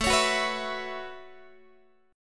A 6th Add 9th A down Guitar; A6add9; strummed

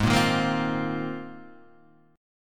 Bb 7th Suspended 2nd Suspended 4th Ab

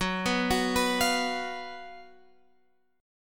F sharp Major 7th Suspended 4th

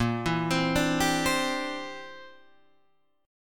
C Major Add 9th Bb down Guitar Standard; arpeggiated; Cadd9

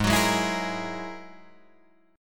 Ab 7th Suspended 2nd Sharp 5th, Guitar, Drop D

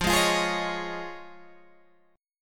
F Minor 7th Sharp 5th, Guitar, Open G

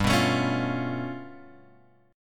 G 9th Flat 5th, Guitar, Open G; 5,4,7,6,6,x; down

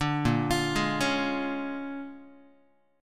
A sharp 7th Sharp 9th A sharp down Guitar; A#7#9 arpeggiated; JGuitar

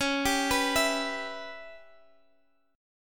C sharp Minor 7th Flat 5th, Guitar, Open D; x,x,11,13,14,14

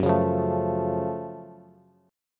Gb Minor Major 7th Gb Bass; strummed; JGuitar

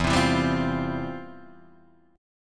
F Major 7th Suspended 2nd, E, guitar

